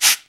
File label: studio shaker sound sample G key; tuned percussion